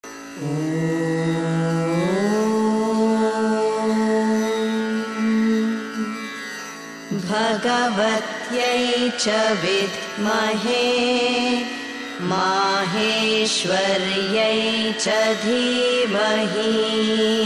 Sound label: annapurna gayatri female voice